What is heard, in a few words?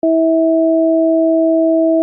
interval, eartraining